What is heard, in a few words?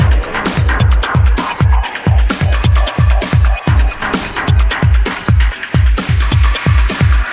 EZdrummer; AiR